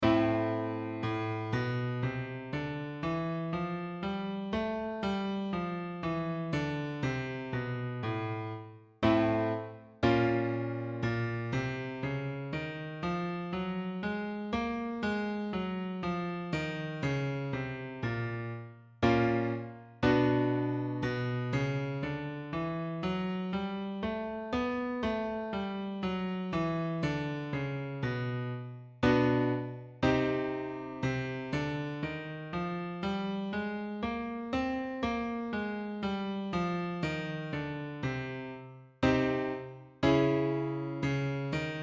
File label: Natural Minor, Minor Scales